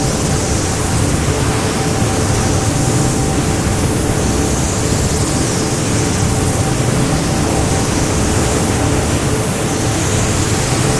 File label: Weather Generator; Doodad